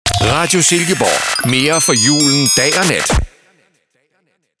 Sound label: Xmas ID